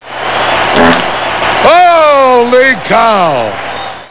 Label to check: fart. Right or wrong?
Right